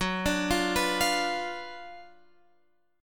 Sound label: Gb Major 7th Suspended 4th Sharp 5th, Guitar, Open F; GbM7sus4#5 arpeggiated; x,13,14,12,14,12